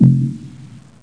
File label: heartbeat; BCI NET; Amiga 8-bit Sampled Voice